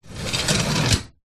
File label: office metal wire tray drawer slide